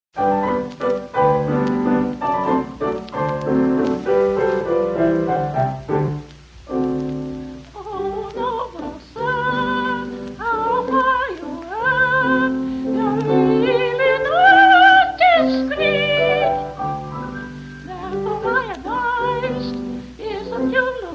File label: Brass Playing Mishaps